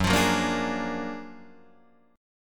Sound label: F sharp Augmented Major 9th F sharp Guitar Open D